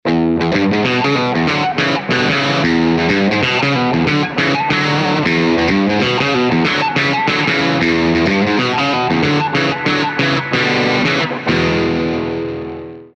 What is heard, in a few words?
double crunch